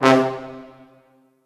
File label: Orchestral Brass